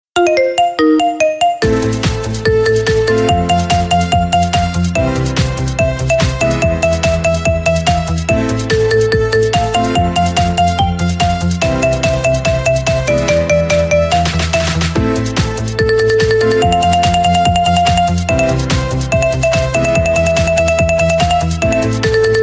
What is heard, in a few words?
marimba remix